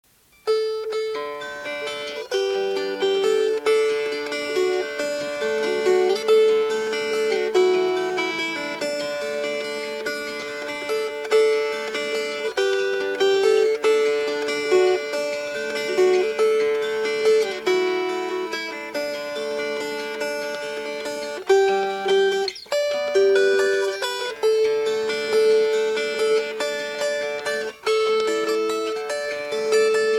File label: Fingerpicked